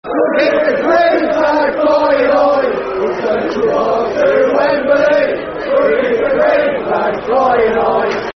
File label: Football Chants, Plymouth Argyle; Green Flag Flying High; Plymouth Argyle Pafc